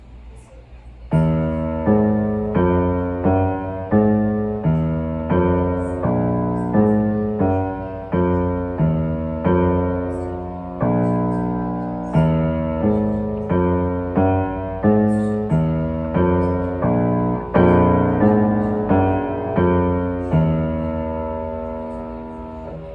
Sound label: piano